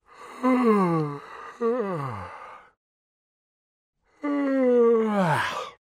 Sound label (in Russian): variant zevaniya